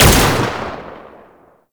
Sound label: Pistol Shot; Weapons; Warframe; Tenno; Temple Signature